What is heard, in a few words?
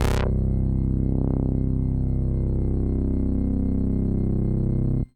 Bass Sid C1; Bungeling Bay